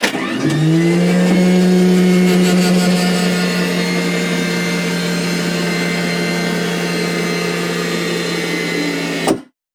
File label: Aircrafts A-10 Cockpit Canopy Open Cockpit; DCS